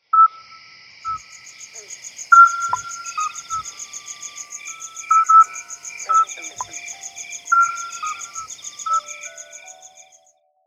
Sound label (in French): Campagne grenouilles